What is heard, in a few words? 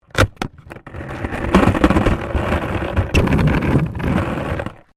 SKATEBOARD UNDERSIDE ASPHALT BOUNCY; Skateboard sounds; Skateboarding; Sports